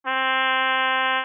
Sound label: trumpet